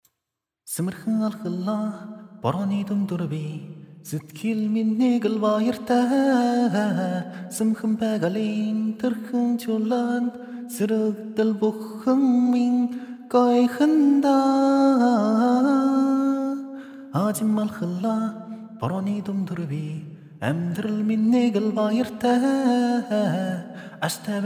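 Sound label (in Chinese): 蒙语歌, 内蒙古呼伦贝尔的蒙古族 性别:男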